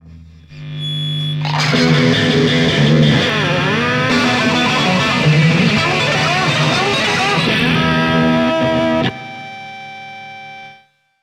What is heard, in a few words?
Thing Sticker - Guitar; Paper Mario Sticker Star